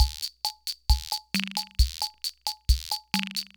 Loop, sr-95; classic machines

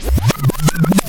SCRATCHES

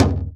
BASS, INDIAN PERC

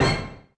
Dance Kit, Korg 01W

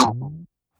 Human Drum, Perc; Lost Tapes From Mars

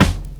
BD, BASS DRUM, 70's Breakbeats

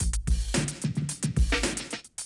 110, Classic Drum Loops

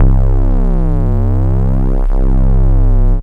Single Electric Basses, Dance Floor Bass

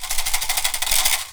PU IL, HAWAII PERC; AKAI S6000 CD-ROM; WorldSounds

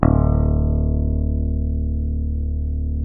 D1, Bass; Sampleheads; Will Lee Bass Library

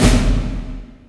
DEATH, AMBIENT DRMS; Spectrasonic Distorted Reality 1

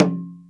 WAYANG KULIT C6, Heart of Asia; Spectrasonics - Heart of Asia CD2

